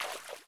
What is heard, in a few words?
small splash; factorio 2.0.55